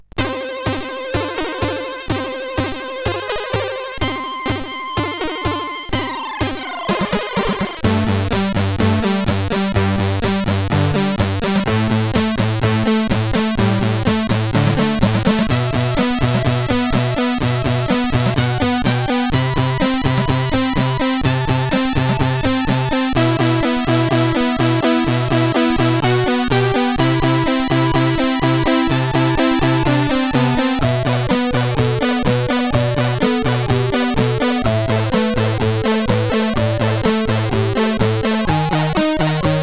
CSG8580

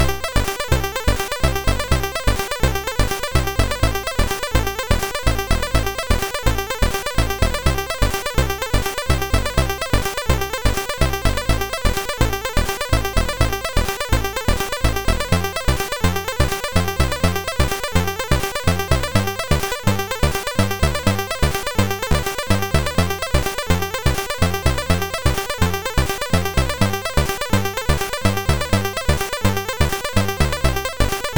Beat, MOS6581R2

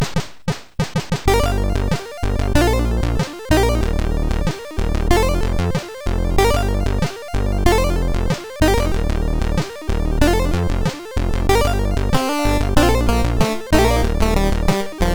Relaxation, MOS6581R2

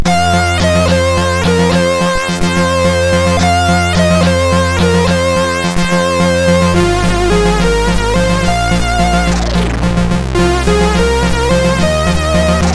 Old Music, MOS6581R2

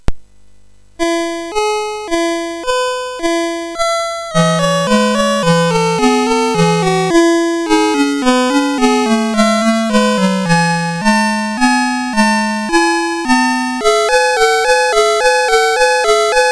sid MOS6581R4, GAMES; Forest of Doom

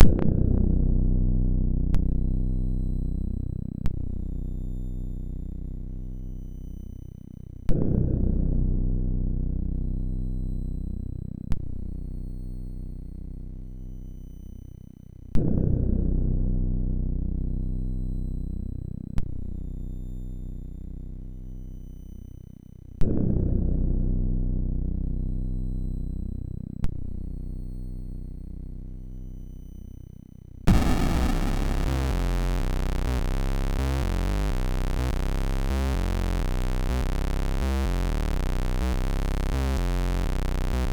sid MOS6581R2; Into the Dark extended mix

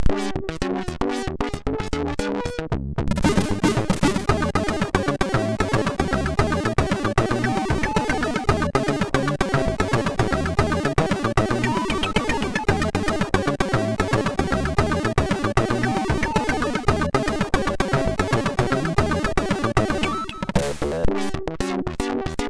Blufunk Jingle, MOS6581R4

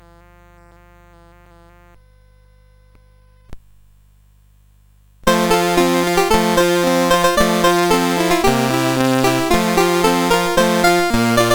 Banjo, CSG8580R5

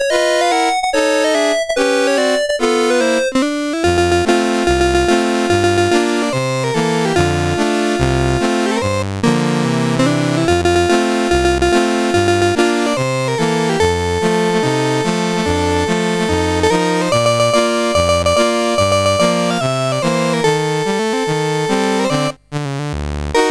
CSG8580R5; Dave Marquis

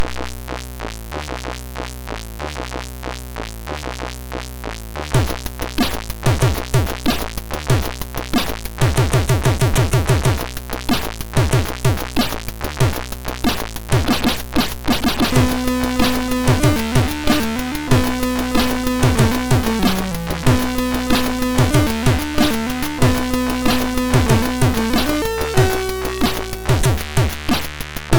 tune, MOS6581R3

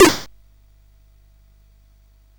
Tecnetium, CSG8580R5

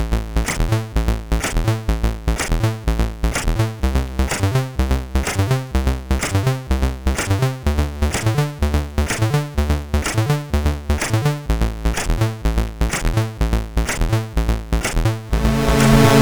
War Cars Construction Kit, CSG8580R5

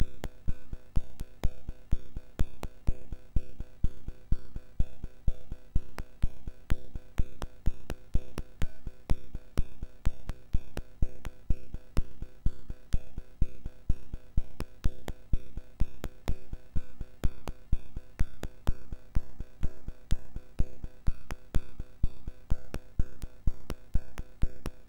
Piano Work, MOS6581R2

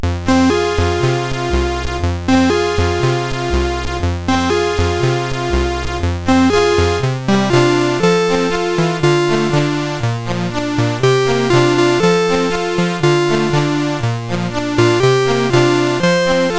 Raindrops, CSG8580R5; Ol Railbird

